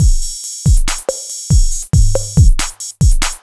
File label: dubstep